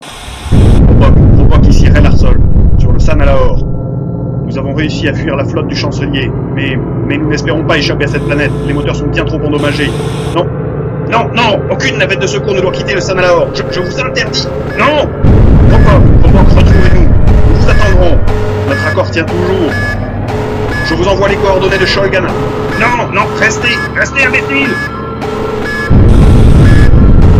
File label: drone